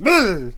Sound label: Cannon Attack Vocals